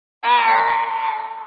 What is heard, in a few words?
aargh